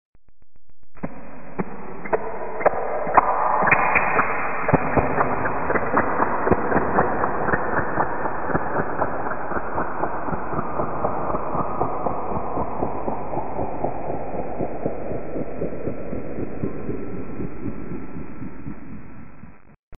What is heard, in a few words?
trautonium; syntezatory